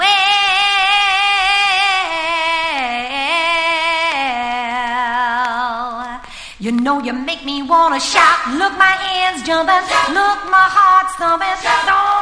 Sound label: ACAPELLA MIX